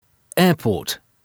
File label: airport